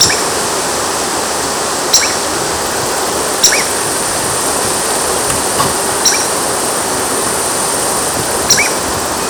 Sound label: Crotophaga Ani; Aves; Cuculiformes; Cuculidae